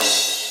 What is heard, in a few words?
Crash, Yamaha TG-33; Drum Samples